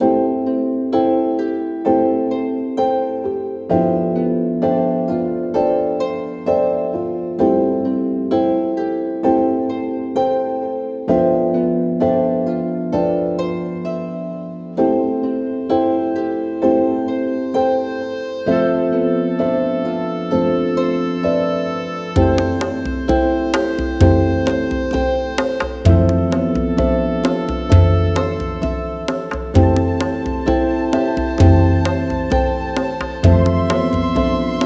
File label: Easy Listening Backing Track; 3 different themes of music for video game.